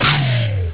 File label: powerup